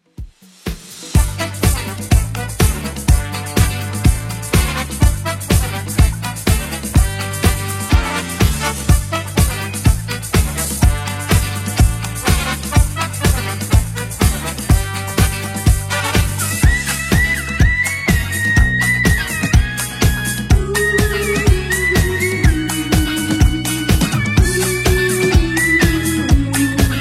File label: Christmas Redrum 124bpm